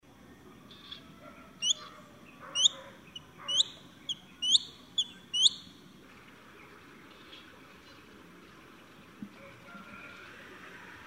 鳳頭蒼鷹; 鳳頭鷹; 鷹科/留鳥

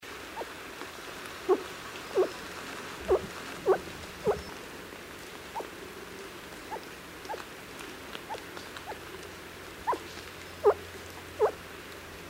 黑長尾雉雄; 帝雉; 雉科/留鳥

